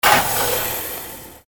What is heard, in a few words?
pneumatic blast